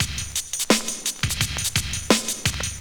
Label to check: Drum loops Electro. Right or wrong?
Right